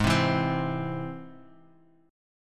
G sharp Augmented G sharp, down; strummed; JGuitar

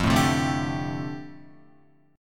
F 6th Add 9th, Guitar, F6add9 chord; F6add9 strummed

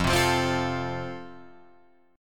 F Major, Guitar Drop D

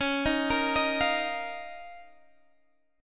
F 7th Suspended 4th Sharp 5th Db

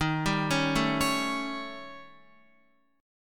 Eb 7th Flat 5th, Eb, Guitar; Eb7b5 arpeggiated; JGuitar

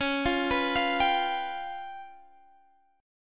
G 7th Flat 5th, C sharp, guitar chord

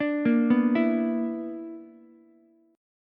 B-Minor Major 7th Double Flat 5th B down Ukulele; BmM7bb5 arpeggiated; JGuitar

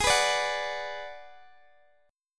G sharp Minor 7th Flat 5th, Guitar, Open G; x,x,18,16,15,16

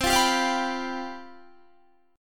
C Major 7th Suspended 4th, down, Guitar; strummed

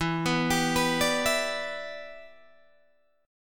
E Minor 7th, down, Guitar, DADGAD; Em7; arpeggiated

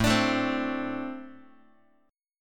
A Minor Double Flat 5th, down, Guitar; strummed; JGuitar

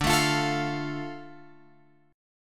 D Minor D Guitar Open F; strummed; JGuitar

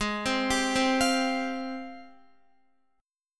Ab Major 13th Ab, down, Guitar; AbM13 arpeggiated; JGuitar

